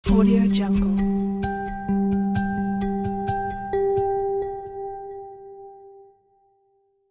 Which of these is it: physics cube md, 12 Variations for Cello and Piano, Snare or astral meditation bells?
astral meditation bells